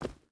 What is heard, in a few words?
footstep rubber boots forest run; Rust